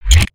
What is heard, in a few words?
model pickup; build mode